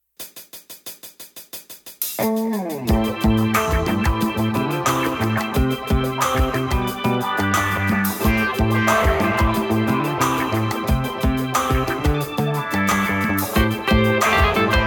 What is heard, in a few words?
Funky